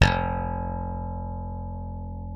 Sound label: F1, slap thumb; Xsample VOL-1